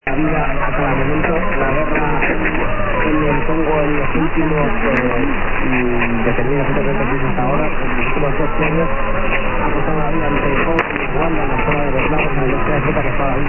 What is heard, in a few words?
dx 855 romania mix spain